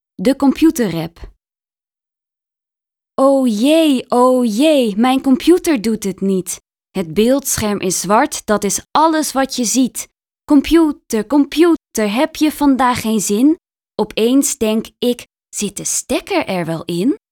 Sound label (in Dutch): De computer rap rap; communicatie; leerjaar-2; taalsprong